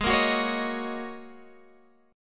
Ab 7th Suspended 2nd Suspended 4th, Guitar, Open F; 20,17,18,20,18,x; strummed